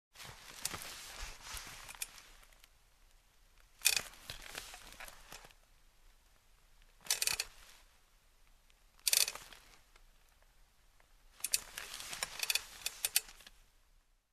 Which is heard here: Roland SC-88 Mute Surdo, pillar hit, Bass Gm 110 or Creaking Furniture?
Creaking Furniture